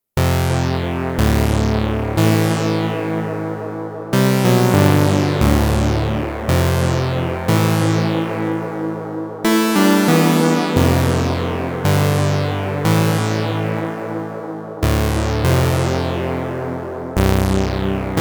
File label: SEM Bass; REPLIKA